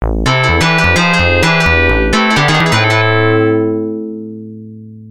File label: Defeat in Race; F-1 Dream; arcade